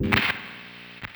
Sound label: guitar stop; Instruments; Zomboid; Lifestyle